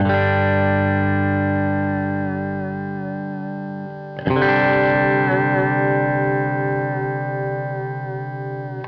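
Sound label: Country Guitar Intro; Digidesign; Pro Tools Essential Loops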